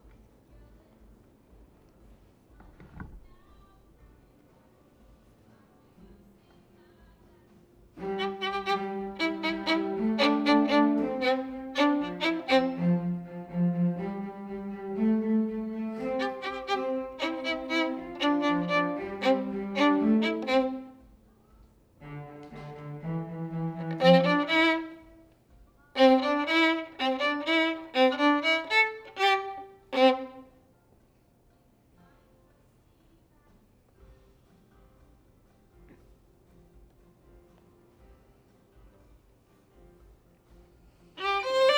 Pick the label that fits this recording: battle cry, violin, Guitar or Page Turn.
violin